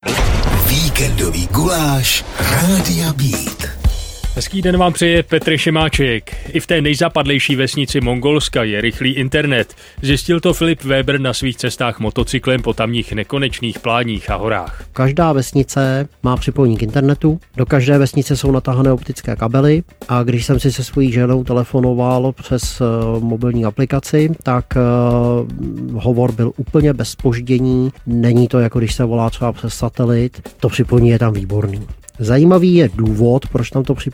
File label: Radio Beat; Mongolsko